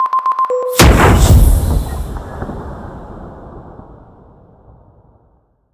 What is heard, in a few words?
Silicone Leak; Plastic Surgery